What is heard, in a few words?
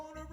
Stereo Mix; MyMix Recordings NAMM 2018